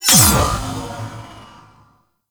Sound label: Boss Fire; Weave Boss